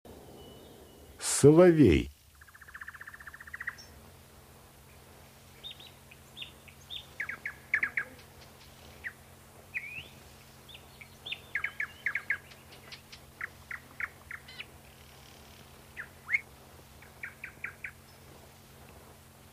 obyknovennyy solovey golos

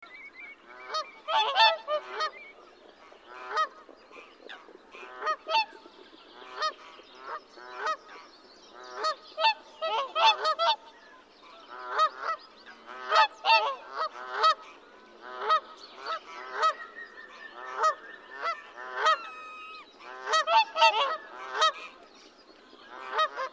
lebed klikun golos

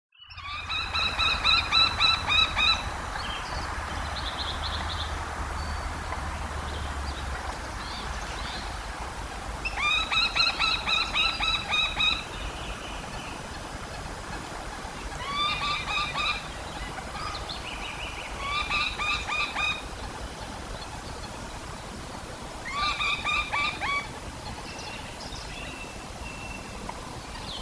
malaya poganka golos

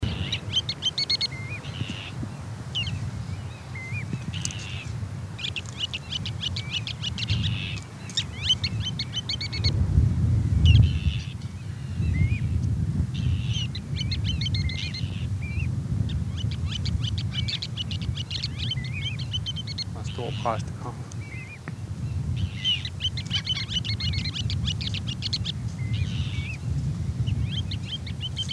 kamnesharka golos

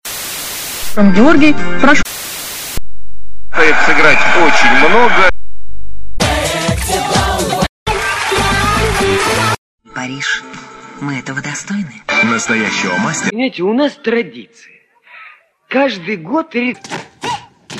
zvuk televizora

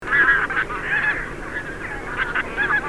gumennik golos

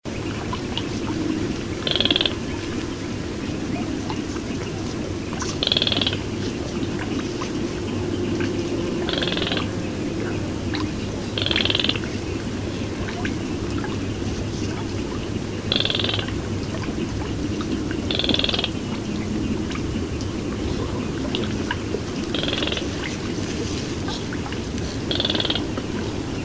pogonysh kroshka golos

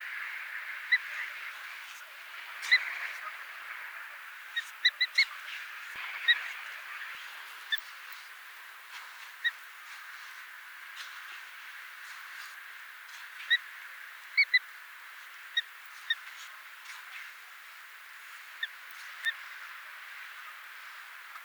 peschanka golos

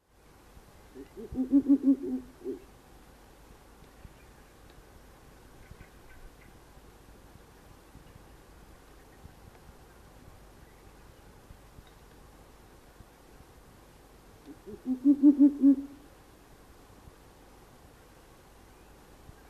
dlinnohvostaya neyasyt golos

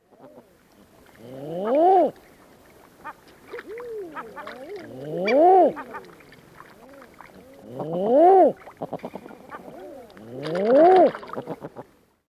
obyknovennaya gaga golos

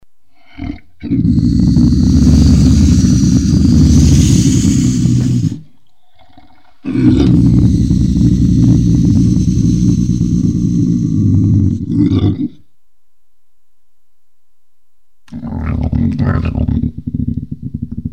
rysi golos

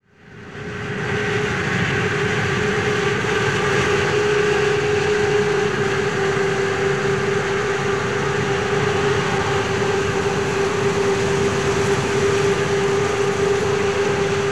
zvuki kamaza

